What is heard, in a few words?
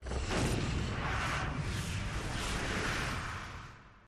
reel spin; archangels